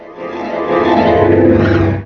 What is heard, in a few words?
Exit Windows